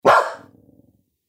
犬の鳴き声

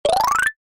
場面切り替え 木琴 上り 遅め

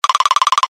ガタガタ震える 短め

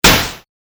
ダメージ レトロ

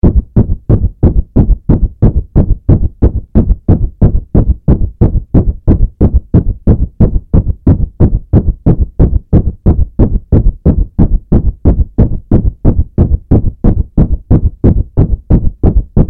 鼓動 モノラル

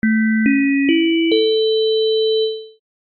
お知らせ・始まり; 戏剧音效

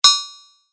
鍛冶 叩く

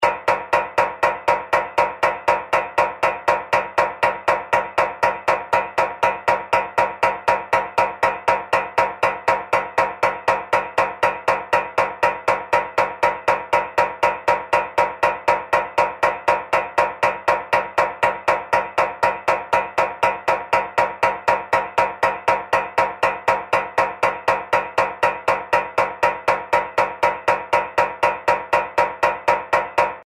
足音 金属 走る; 足音の効果音

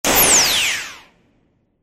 ワープアウト

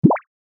ぴょこ

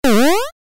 ジャンプ レトロ

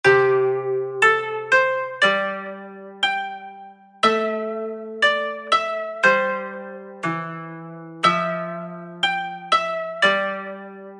和風のBGM

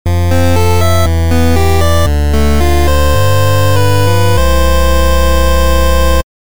休む 休憩 寝る レトロ モノラル

